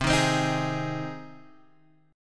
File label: C Minor Sharp 5th C Guitar Open G